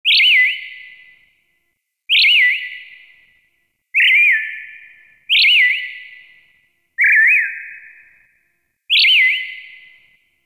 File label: Terrarium Bird Chirp F; Warframe; Ambience; Entrati; IntTerrarium